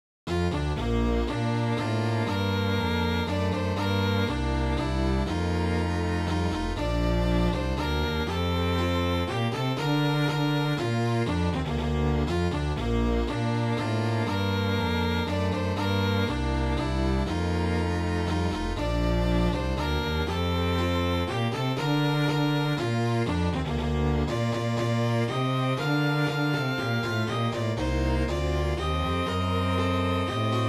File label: star spangled banner strings